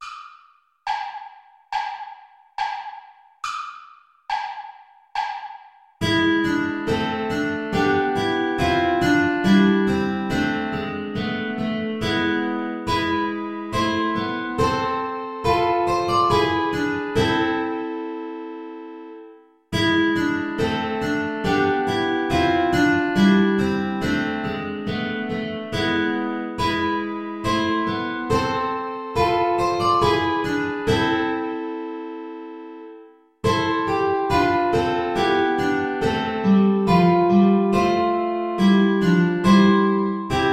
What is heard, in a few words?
play along without guitar; bach johann sebastian; wie soll ich dich empfangen